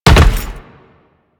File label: Land Heavy Metal, Footsteps; Enemies; Warframe; Teshin; DE